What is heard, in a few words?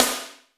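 snare drum; Zero-G; Chemical Beats; SNARE ZOO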